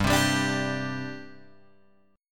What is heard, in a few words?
G Suspended 4th, Guitar, Open G